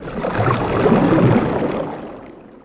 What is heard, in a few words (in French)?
Vagues